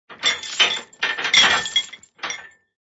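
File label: anchor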